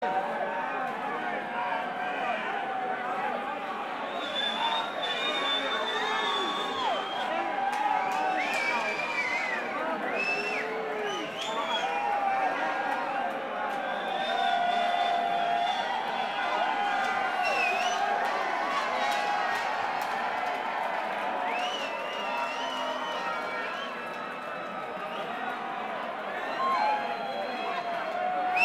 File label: Banter Crowd